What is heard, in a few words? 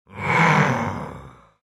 Horror Monster Frankenstein Growl Creature; Monsters